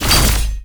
sword cut

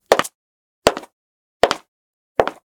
leather shoes fts

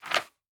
ui photo fix

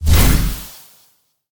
skill refresh shield

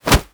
punch

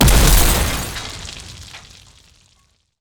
chainsaw extension

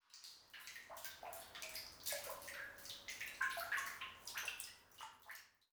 sewer

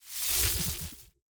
vwgun

